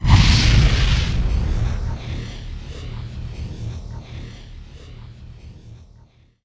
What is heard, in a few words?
THUNDERBALL CAST